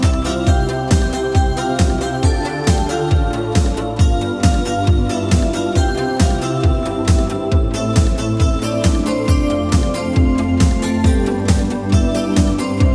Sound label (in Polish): chlopak z gitara